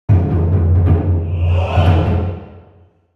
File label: Ethnic Fanfare; Hack and Shoot Heroes